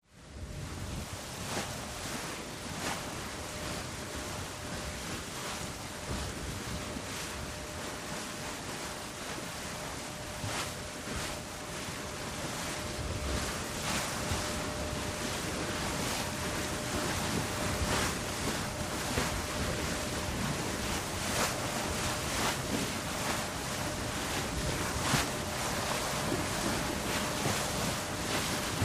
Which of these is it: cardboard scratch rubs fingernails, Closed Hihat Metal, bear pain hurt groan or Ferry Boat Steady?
Ferry Boat Steady